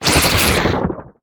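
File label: Teleporter Stealth Attack; Evolve; Wraith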